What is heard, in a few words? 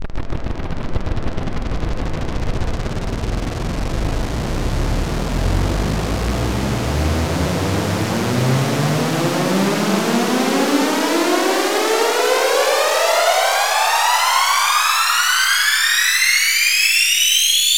Riser; neuro; InsideInfo